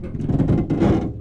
car trap rope, ambient materials

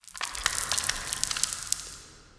debris random, ambient

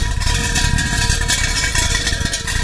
canister scrape rough loop, metal; physics

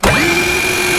minigun wind up; weapons

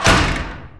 door metal gate close; doors

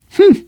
positive noise, survivor voice biker

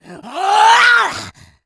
rage, infected, female

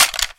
grenade launcher action closed; weapons

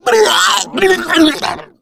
female boomer vomit

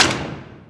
door metal medium open, doors

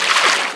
wade, footsteps infected run

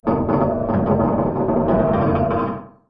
docked boat sfx

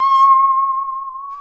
saw danger, music, zombat

